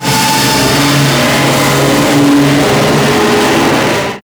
Sentient Vessel Movement Limb, Enemies

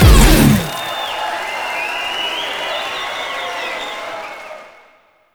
On Beat Solo; Warframe; Temple

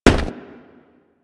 Crewman Footstep Run; Warframe; Corpus

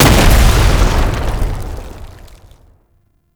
tank infested swipe impact; Enemies; Abilities; Lasrian Tank; Warframe

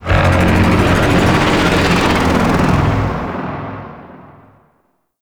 Void Dragon Vocal Idle; Warframe; Duviri

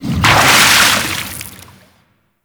Forest Large Piston Water Splash; Props; Warframe; Grineer Forest

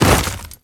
Step Ice, Footsteps; Warframe; Grineer

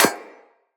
Bullet Impact Generic Metal; Weapons; Surface

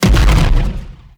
Biped Railgun Explosion; Warframe; Corpus; CorpusRailjack

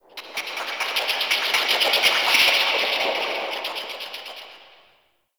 Crackly Birds; Ambience; Warframe; Shadowgrapher

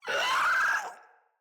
Infested Crawler Pain, enemies; Crawlers

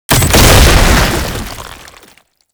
Chem Nox Death Explosion; Warframe; Lasrian Soldiers

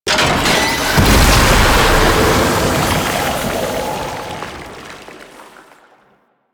Gas Leak Stinger; gameplay; Effervon; Warframe; NineteenNinetyNine; Stage12PartyOfALifetime

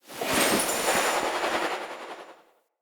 Casting Device Projectile Whizz by; Galene

